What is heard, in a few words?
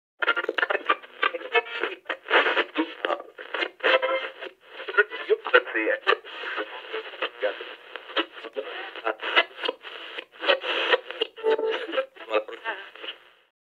Radio Tuning Sound Effect